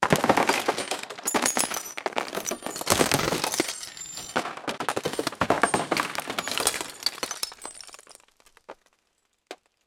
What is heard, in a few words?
detailed sound, debris